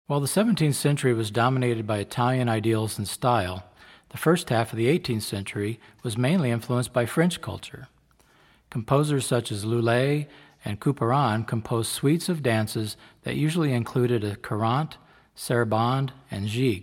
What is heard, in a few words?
Suite in D Major, Baroque Trumpet